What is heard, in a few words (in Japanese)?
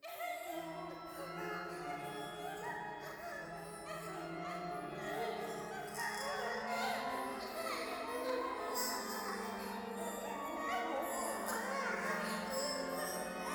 喘ぎ 讃美歌